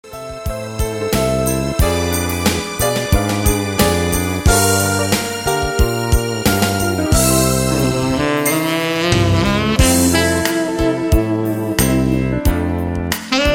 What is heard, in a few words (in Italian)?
sax notturno